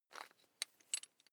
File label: electric drill bit fasten wrench turn loose